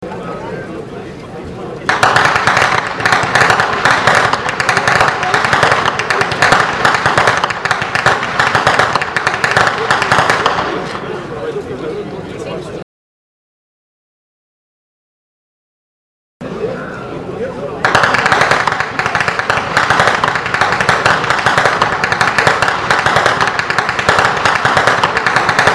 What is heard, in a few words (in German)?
schnalzen; anthering